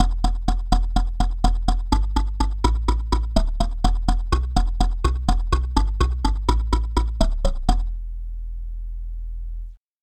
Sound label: kalimba bass